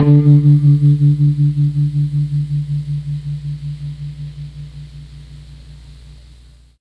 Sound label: D2 guitar, MKII mellotron